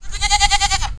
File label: goat; FILM QUOTES; ANIMALS